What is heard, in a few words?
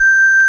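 ORG Vox G, Continental organ; Continental2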